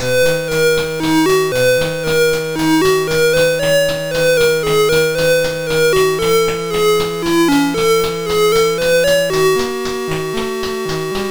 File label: Wild Ride, MOS6581R2